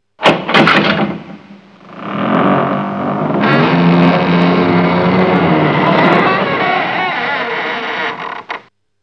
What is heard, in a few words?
open gate; dojo